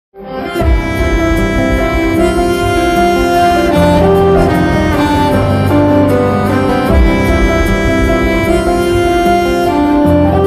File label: love bgm; month of madhu; middle class madhu